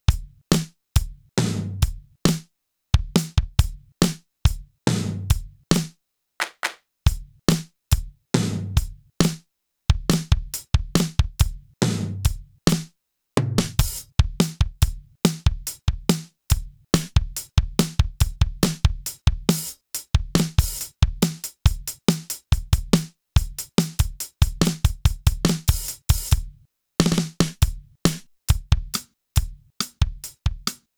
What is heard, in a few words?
Original Clean Kit Mono Dry Mix